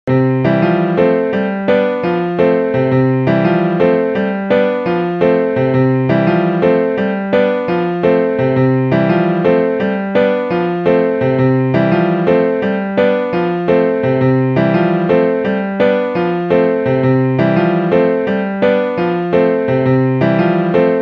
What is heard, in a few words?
piano